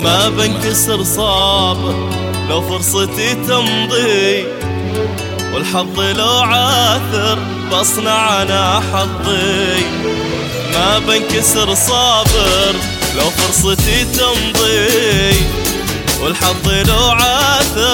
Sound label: arabic song